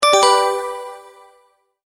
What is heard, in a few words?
multimedia tone, Goodbye; Sound Design; McKinney Sound